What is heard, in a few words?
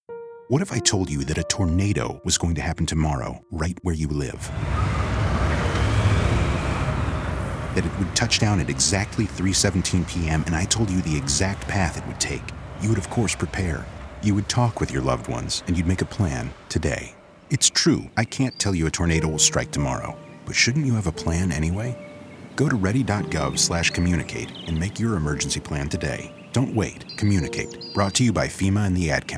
tornado